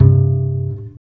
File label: TRICKS, DBASS, Jazzistic; Univers Sons